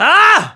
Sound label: Attack; Link